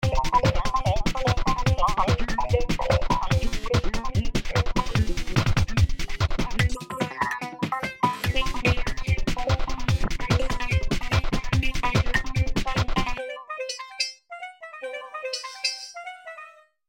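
Curious Perc, Beats, a wave with different sounds on each key with the arpeggiator; V-Synth; Element Wave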